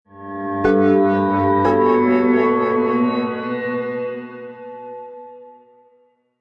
Scifi Audio Logo Ident, Glowing, Metallic, Lingering; Lynne Music